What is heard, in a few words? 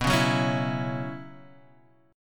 Gb 7th Suspended 4th B